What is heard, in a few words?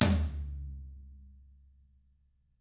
TM14HITMFR-L, Double Platinum Drums; TAMBKIT